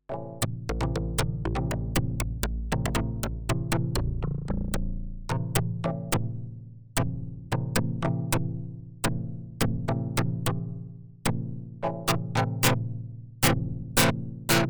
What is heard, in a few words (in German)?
Hüllkurven